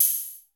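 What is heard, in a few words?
analog open hat; 90 sSampleCDs; Crypton - DrumBase5000; ANALOG KITS1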